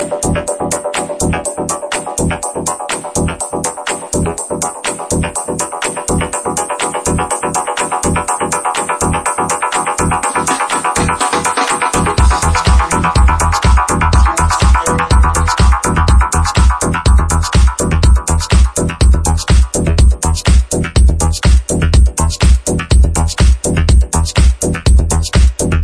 House

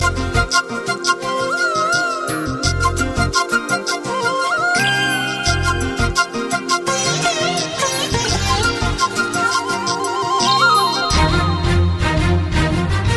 Telugu Music; meloboom

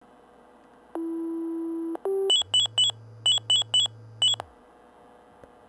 Minitor V Tone

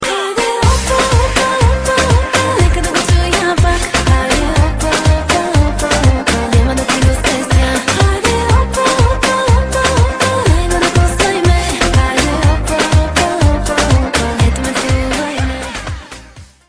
Awesome Song

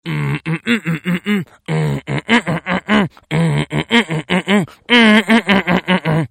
Dance Stupid Singing

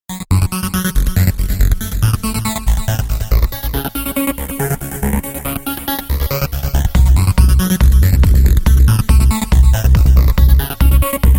Ringer

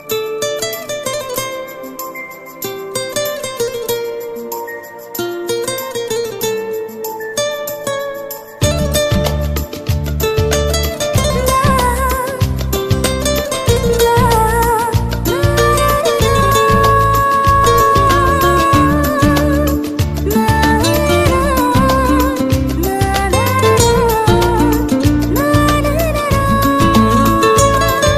Soft Romantic

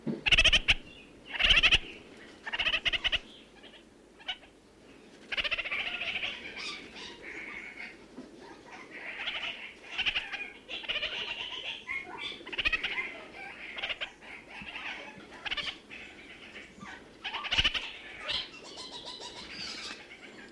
parakeet- kakariki

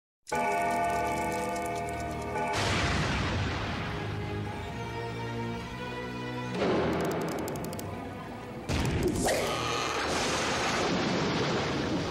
Spooky Sounds

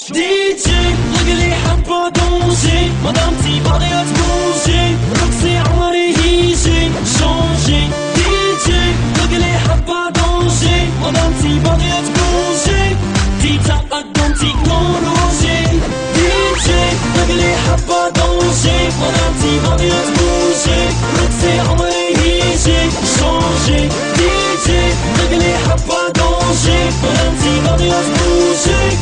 Dz Rap

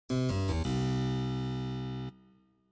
SMS Harpsichord

